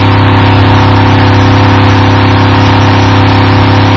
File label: Sportscar; 暴力赛车